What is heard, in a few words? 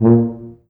Tube Stac; Samulis; pitchglitch